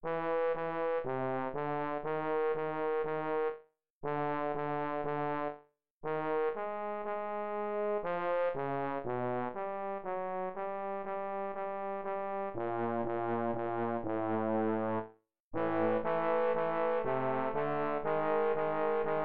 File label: 12 Tone; Mary Had a Little Lamb